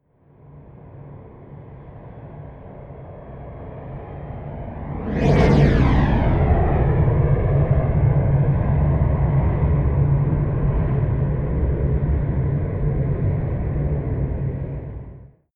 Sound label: plane cessna private flyby right to left close; flugzeuge verkehrsflugzeuge; verkehr transport